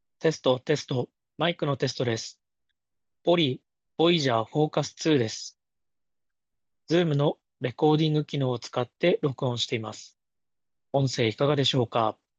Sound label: VOYAGER FOCUS 2 zoom